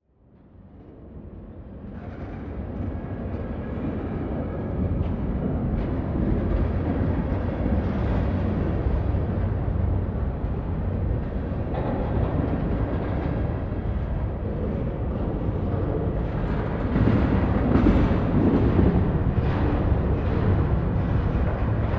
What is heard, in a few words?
train distant pass